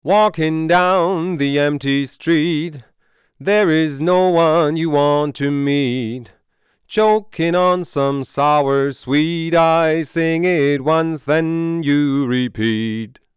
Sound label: Summit Audio TPA 200B Vocal Medium Overdrive